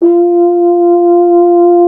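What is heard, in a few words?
BRS F.HRN, Solo Instruments, French Horn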